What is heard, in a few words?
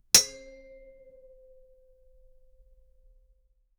wood spatula hit, cooking